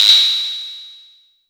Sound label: Noise